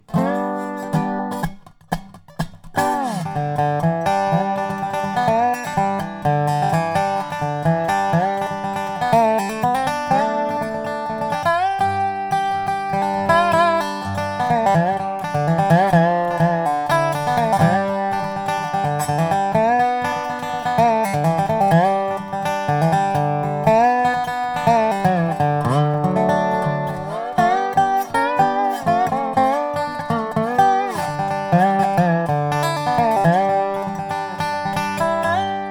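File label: Maple Tortoise, Custom Resophonic Guitars; Mistr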